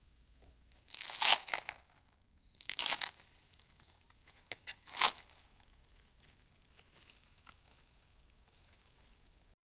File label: vegetable cut, carrot